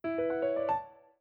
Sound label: Hardware Insert